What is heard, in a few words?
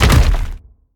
medium platform stop; Scrat